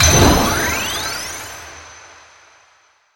Mission Clear; Pac-Man Championship Edition 2 Plus

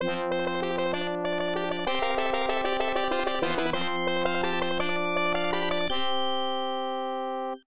Victory Fanfare; Nobunaga no Yabou Haouden; FM Town

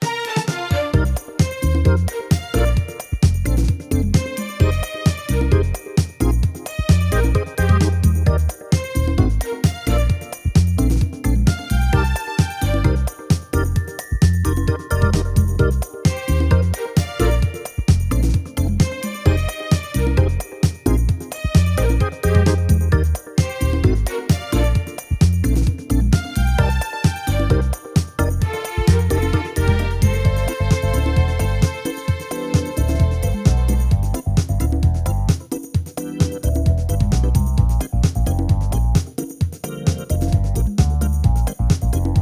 Drum Jam Menu

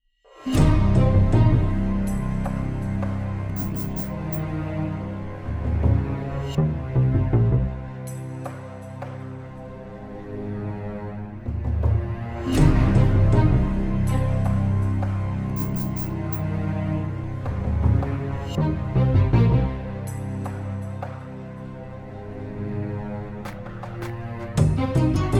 Septic Tank Music

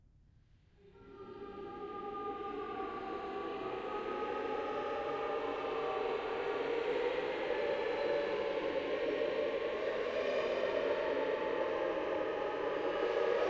Choir; Outlast 2; PS4 Windows Xbox One

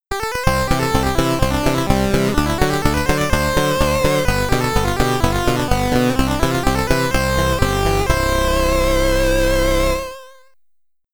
Get Ready!, SID Stereo; ramparts c64

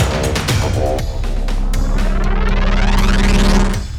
Taunt; Skyway

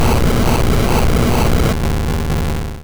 Fire Spin; Pokemon; Gen 1; attack moves; RBY

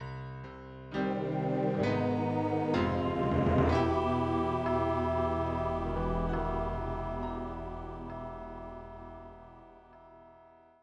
Fanfare, Roland SC 55

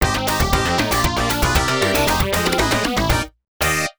Coin! Coin!; System 16C Arcade; Fantasy Zone II DX

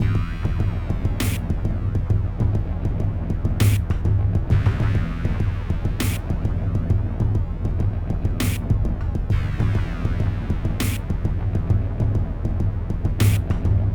Music (Gameplay State Melee); Music Hideout1; H1 Level Area=Building2 1st Part

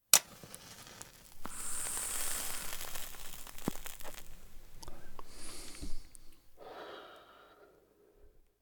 Pipe Light; the 39 steps

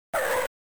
screeeechhh

